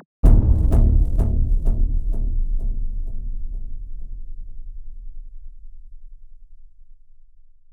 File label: BRAMS, Cinematic Sound Effects; 99Sounds; Generdyn